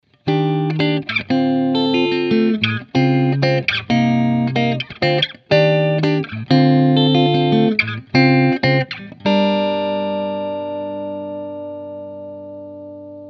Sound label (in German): framus artist phil xg p90 p90 clean steghals